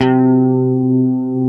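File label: ESP C4, chorus guitar; East West - Global Sounds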